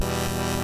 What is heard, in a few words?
Game Over, MOS6581; SID; MOS6581R2